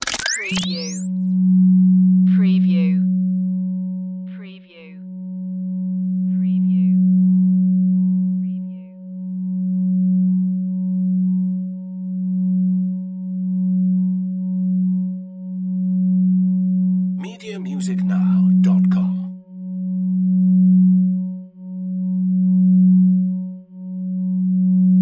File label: Scanner Switch Run; sound effects bible